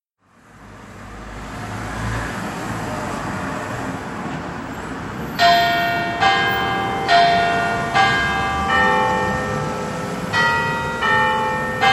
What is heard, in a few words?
new chime; Lady Lourde